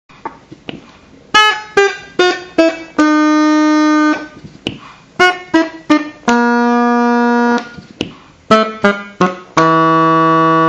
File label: regs